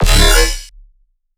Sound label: spectral impact, bass